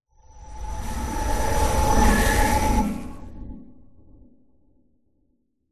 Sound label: dimension power glitch